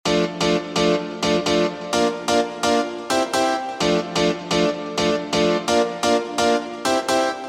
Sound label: Keys edm Vital